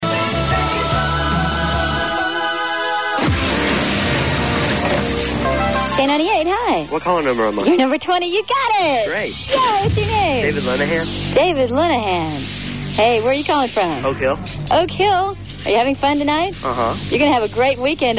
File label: concert